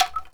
anklung; ILIO; Synclavier Percussion 2; World & Orchestral

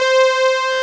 SYN, Analog; Sound & Vision; Gigapack I CD 2

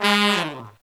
Pop & Funk Brass, BRASS; Best Service ProSamples vol.25; AKAI

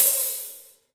HH hh; Best Service Gigapack 2

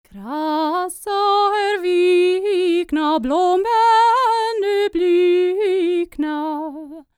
SCANDINAVI, World Voices; ILIO; Vocal Planet VOL-5

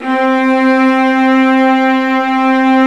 Violas Bright, String Sections; Roland LCDP13

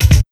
ROCK 132, Big Beat Drumloops; AKAI

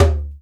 DUMBECK; E-MU Formula 4000 Series Vol. 2; Dance Set 2

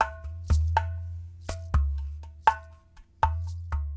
clay pot, world percussion; Rines, Shaker

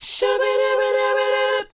SHOO B A#A, R&B & Dance, Vocal; ILIO; Vocal Planet VOL-4; SHOO BE 80

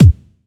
Kick Deep Bass House; DIALUP DISCO

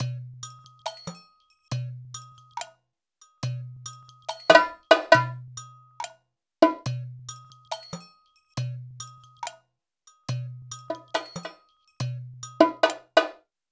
Hand Drums; Sonic Foundry; Cinematic Funk Urban Tone Poems; Master Zorn

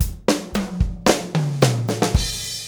Fills, Monster Beats; Zero-G

